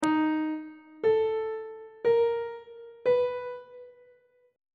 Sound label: e flat 5th interval pitches on treble clef